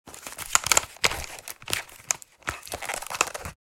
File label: Brain teaser solve puzzle solution; leisure sound effects